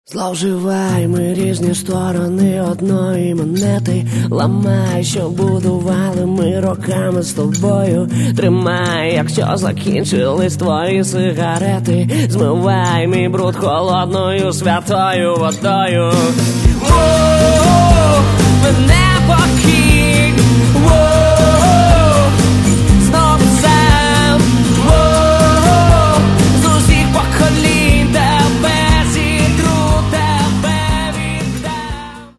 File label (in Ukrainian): akustychny